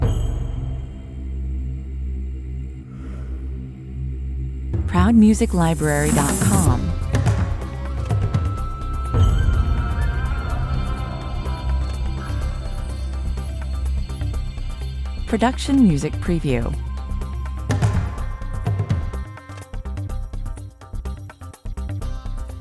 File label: street chase 125 bpm